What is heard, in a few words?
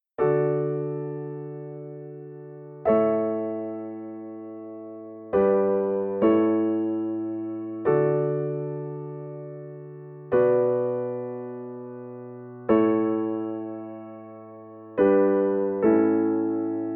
keyboard voicing